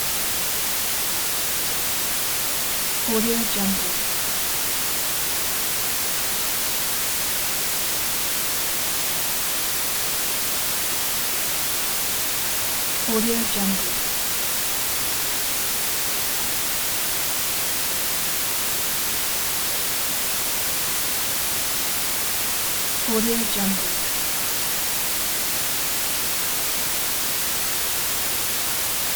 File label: test tone white noise; prosoundfx